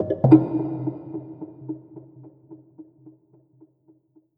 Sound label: Tribal Omni; Koloro